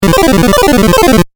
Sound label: 8 Bit Style Arpeggio; Video Game Sound Effects